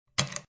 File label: Ice Cb Thrw One, Ice cube thrown in glass.; Party (Sound Effects:Bars)